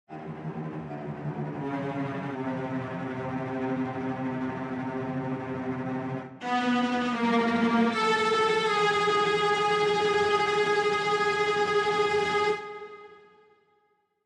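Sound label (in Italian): violoncelli tremolo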